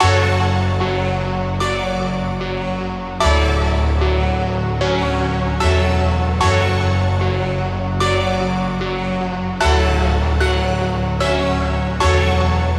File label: Keys F#m 150, Trap Loops; Melody Kits; HipHop; Ghosthack; Gone